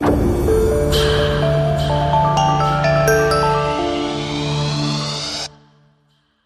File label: Light n Smooth Ident